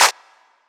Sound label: Clap